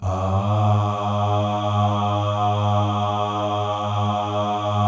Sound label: MALE AHS, Symphony of Voices; Spectrasonics